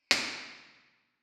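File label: IR sweep binaural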